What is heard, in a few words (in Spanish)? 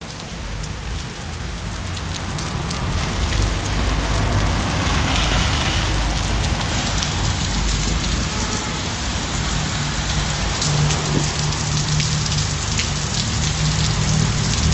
lluvia